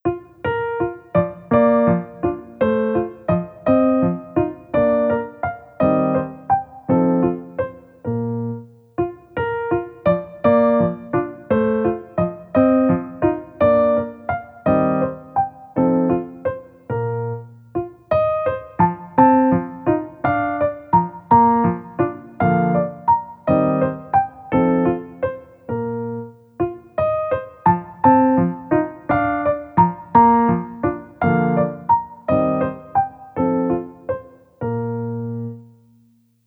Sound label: German Dance, piano; beethoven